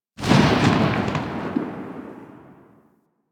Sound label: Flame Spear Tail B, Grineer, Weapons